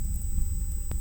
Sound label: Hydrophone